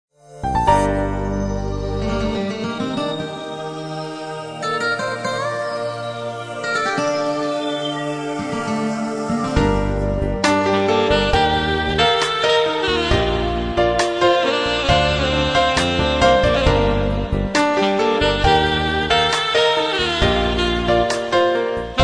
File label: saxy